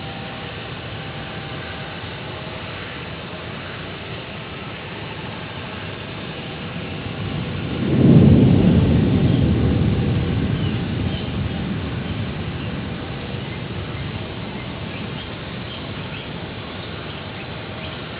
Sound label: eq, acoustics; pmel; vents; pioneer